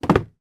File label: foley card shoe box down on surface; zapsplat